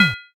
plate set

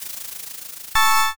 answer roll

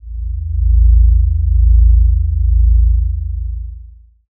space drone

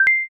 notify message

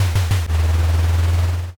engine start

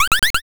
monkey

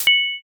block clear

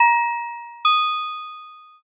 shop enter

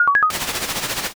fax

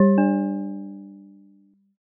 china gong

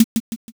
m keytap

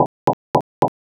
fs carpet

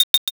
scissors

